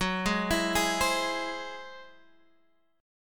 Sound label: Ab Augmented 7th Gb, guitar